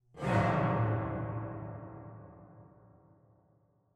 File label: cave, ambient; minecraft; SMCNV-pack